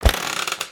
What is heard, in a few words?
Chest Open